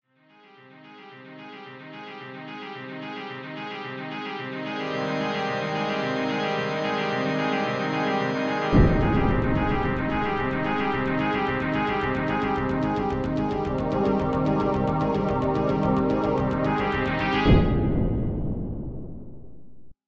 Cinematic Intro Music Deep

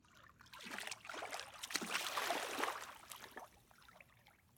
Water Movement Body Step Out Of Pool Splash Drips

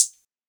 Hat

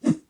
Swinging Staff Whoosh Low

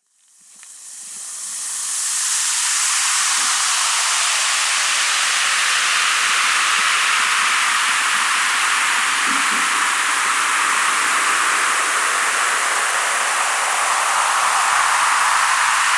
Soda In Vinegar

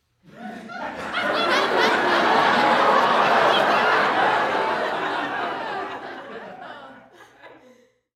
Short Laughter Staggered

